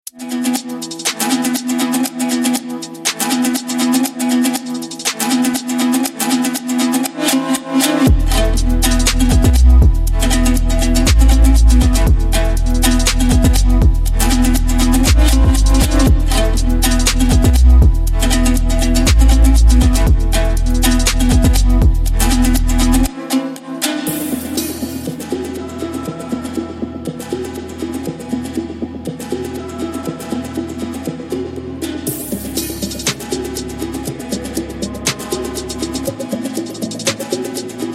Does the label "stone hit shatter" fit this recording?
No